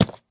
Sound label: step heavy; smite; chiron